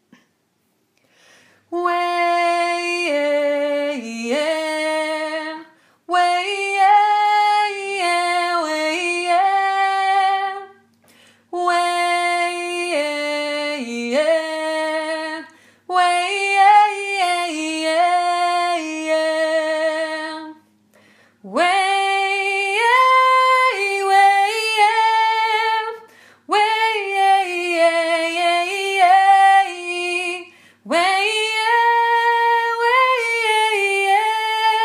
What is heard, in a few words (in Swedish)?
Melodi; Ronja